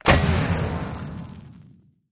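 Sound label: ui button next boost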